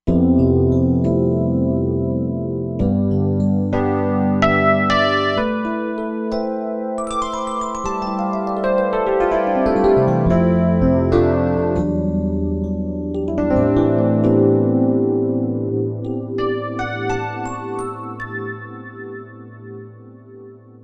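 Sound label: piano Rhodes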